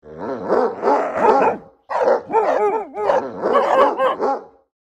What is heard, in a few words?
Angry dogs aggressive barking and growling